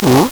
LSDj, Nintendo Gameboy; 300 Drum Machines

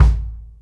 Kick Low; EMU MoPhatt

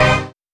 Synths Root C

